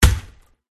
dark prince attack contact middle

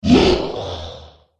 dead pirate boss wound

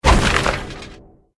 Bike Die

